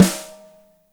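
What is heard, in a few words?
DRY snare, NATURAL KIT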